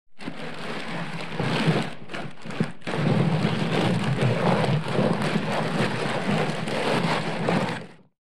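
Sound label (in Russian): картонную коробку тащат по полу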